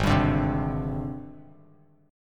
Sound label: G sharp Major Sharp 11th, Bass Standard; G#M#11 strummed; JGuitar